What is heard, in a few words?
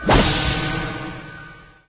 predator cloak